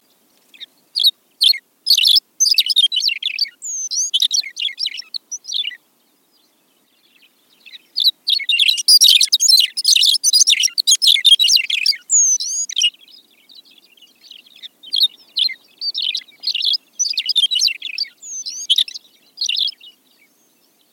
McCowns Longspur

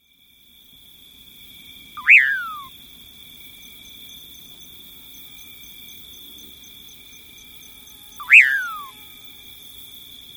Common Pauraque

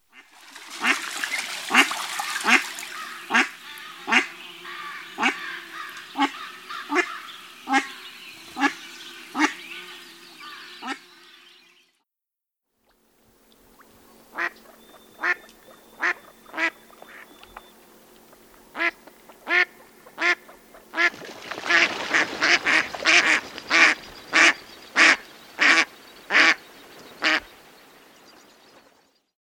American Black Duck

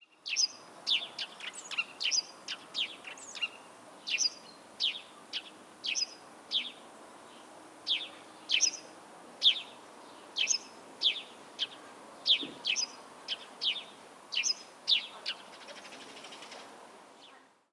Eurasian Tree Sparrow Passer montanus

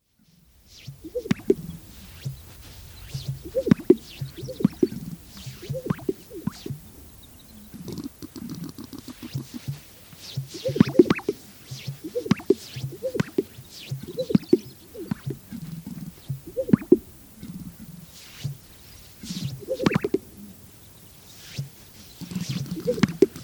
Greater Sage Grouse

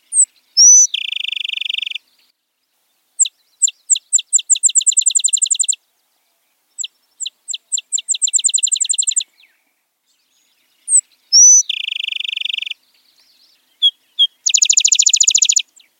Rufous winged Sparrow

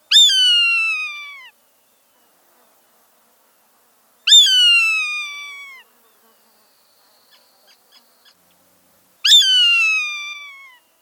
Swainsons Hawk

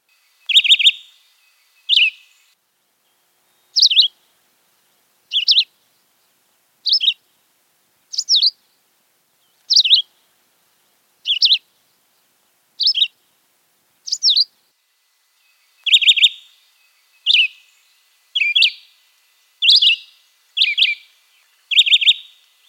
Philadelphia Vireo

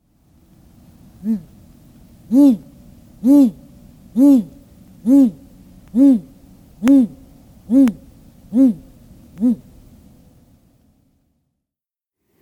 Great Gray Owl

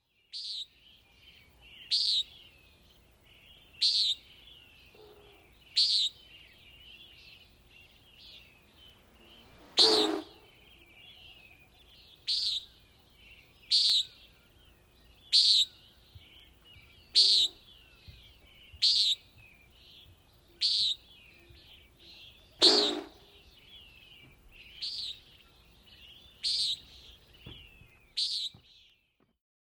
Common Nighthawk

